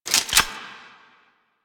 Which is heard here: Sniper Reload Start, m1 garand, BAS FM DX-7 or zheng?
Sniper Reload Start